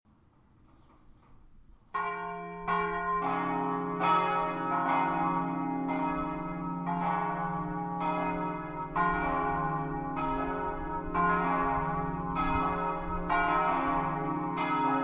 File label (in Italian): ZIRACCO, campane